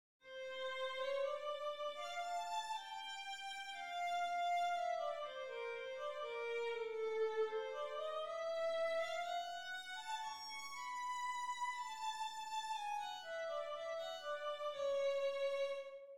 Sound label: music